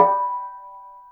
combo; tetrio

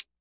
side hit; tetrio

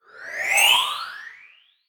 rating raise; tetrio

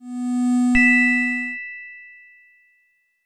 level; tetrio

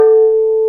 combo power; tetrio

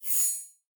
ribbon tap; tetrio

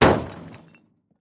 garbage rise; tetrio

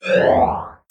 score slide out; tetrio

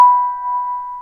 combo; tetrio

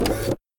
menu back; tetrio

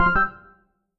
pause continue; tetrio

